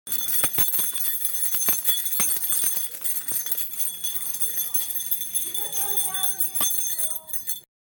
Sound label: keys